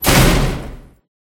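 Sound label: Ventilation vent door slam; SFX Environment Ventillation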